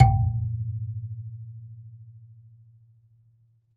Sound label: AFRBASMP, BASSE AFR; Univers Sons - Raricussions